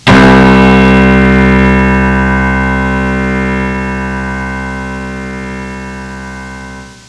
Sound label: SUSTAINE, STRINGS